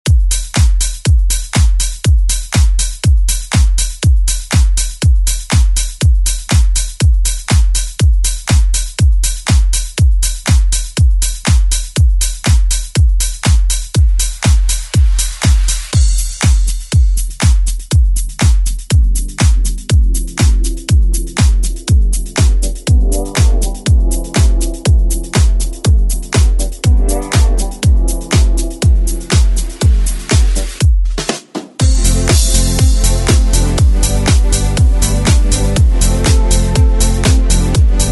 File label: 70s Club ReWork